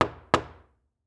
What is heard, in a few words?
Door Knock Door; MinorHallutinationsSounds; SFX Producer